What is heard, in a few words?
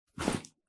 close inventory